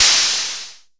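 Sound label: MS20 CRASH; INIS - Funky Xtreams; MS-20 KIT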